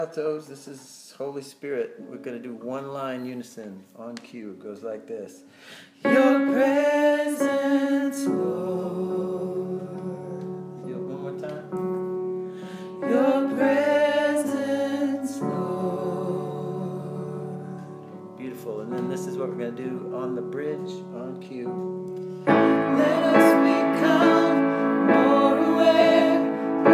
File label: ALTO